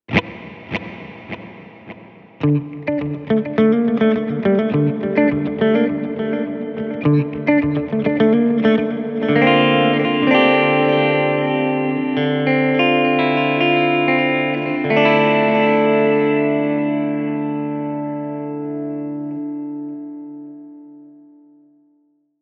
Delay

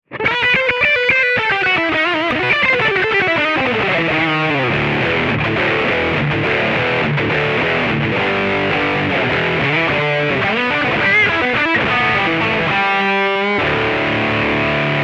metal stack

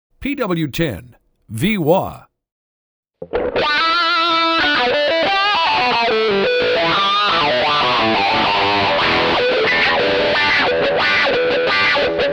tonedemo

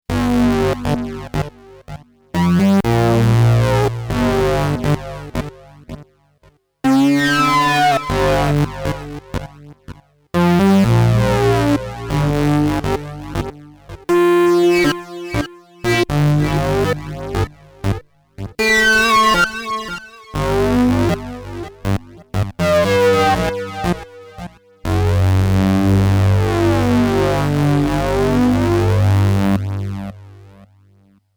sh 201 fb osc lead

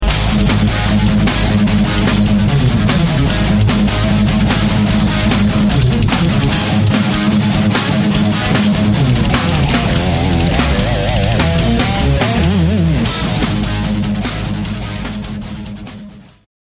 light rhythm; gs10

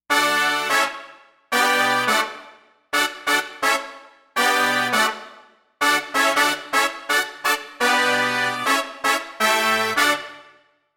brass; BreakOut Brass; JUNO-Di Patch Demo.

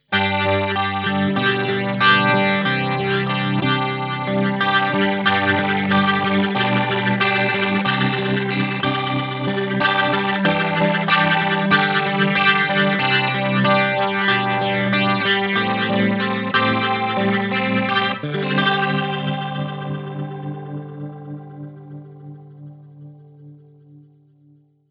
auto rotary

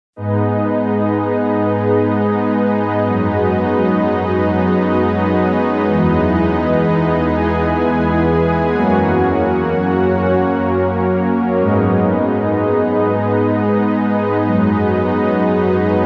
vr-09 soft pad

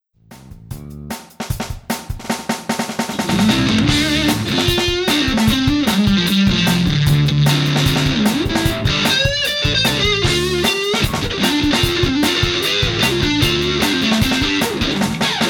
sound check

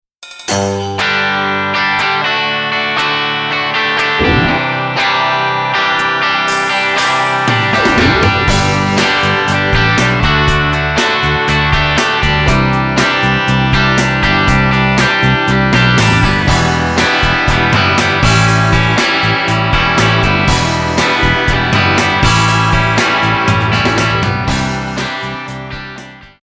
patch demo

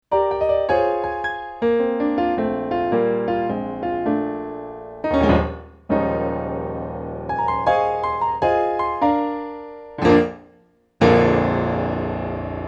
grand piano

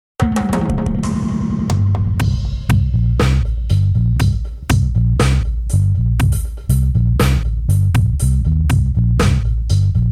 bass; dd 6 tone demo

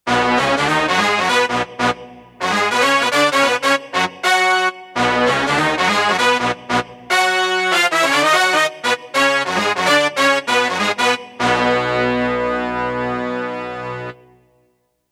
brass

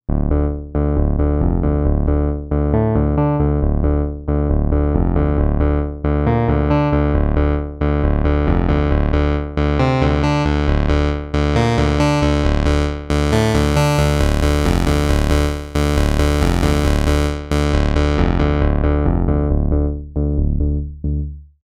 Piano

